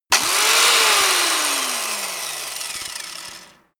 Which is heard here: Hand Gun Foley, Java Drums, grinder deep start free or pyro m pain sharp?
grinder deep start free